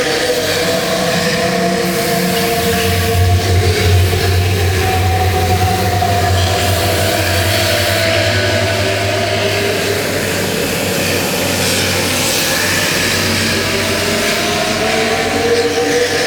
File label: Corrupted Aura Inner Loop; Warframe; Zariman; Survival